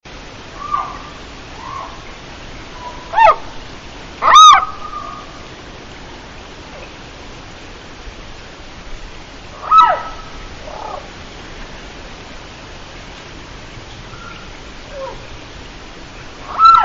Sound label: ishikawagaeru call, frog